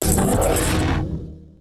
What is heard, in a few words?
attack, cylarkan, creatures; combat; CosmicRageSounds